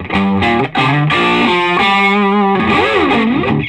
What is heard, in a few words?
SOLO G, Vintage Blues Guitar; USB Soundscan vol.22; AKAI